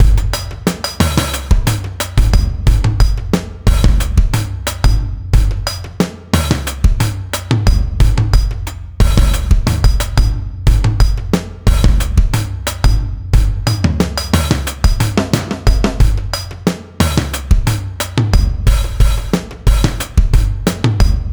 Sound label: Beat, Drums, Eclectic Electronica